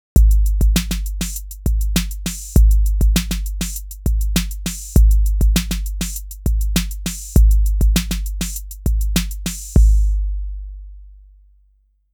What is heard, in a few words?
tape 808 naked preset; Reason Redrum; goldbaby; 808 shootout